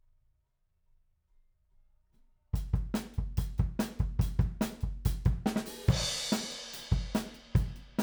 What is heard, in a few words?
Room